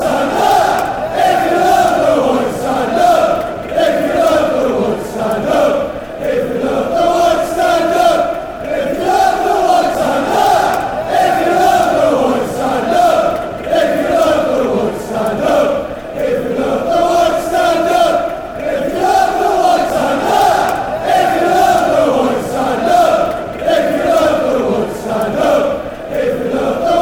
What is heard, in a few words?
chants; stand if you love whites